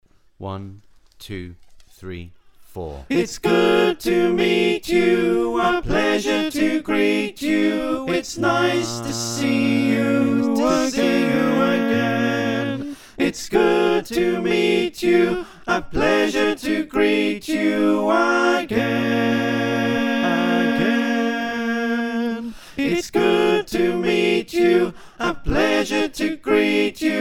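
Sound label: soprano alto tenor bass, choir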